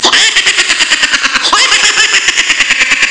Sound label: laugh; ST-XX Amiga Soundtracker Sample Packs